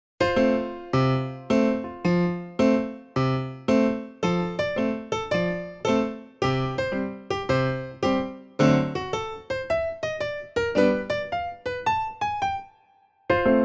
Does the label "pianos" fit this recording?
Yes